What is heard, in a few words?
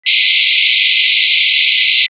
2kHz 4kHz highpass noise